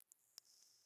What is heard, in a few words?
water drip light; Rust